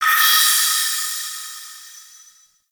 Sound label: Distant Drip; Warframe; Ambience; Entrati